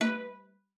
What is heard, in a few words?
B4, VIOLA FINGER; Xsample VOL-5